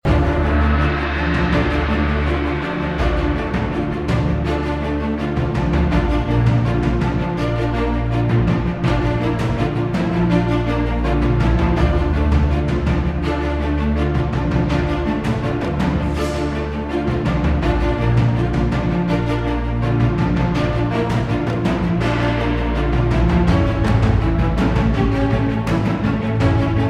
battle orc barracks; The Warlock of Firetop Mountain

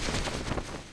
Clamp

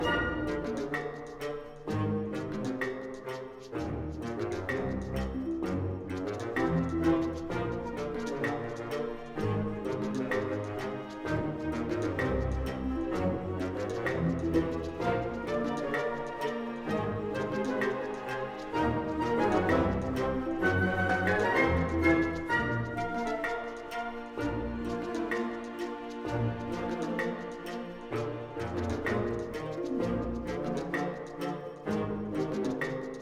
Music Slow Theme Bpm64 Loop; asterix obelix slap them all 2

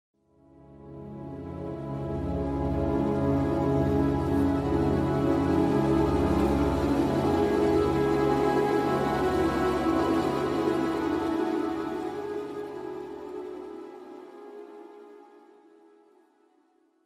Suspense Theme

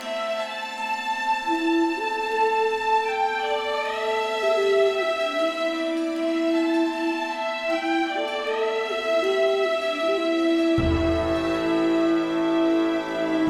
Wake Loop; Almightree The Last Dreamer

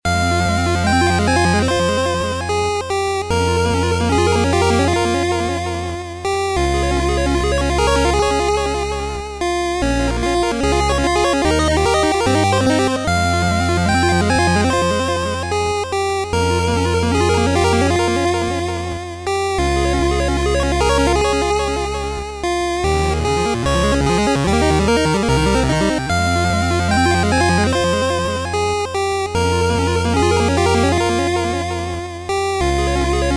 Sad E; Towel Defence

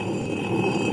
Arrow; Cut the Rope Time Travel

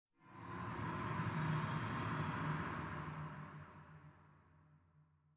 magic Transfer Medium; Desert SFX

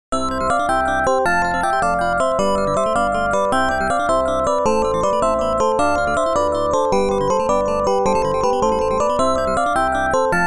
Chapter Cleared; Tokyo Twilight Busters: Kindan no Ikenie Teito Jigoku Hen; OPNA; PC-98

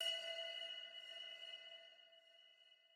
UI Sea

